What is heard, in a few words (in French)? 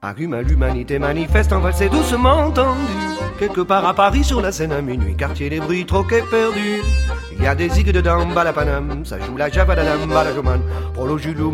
la valse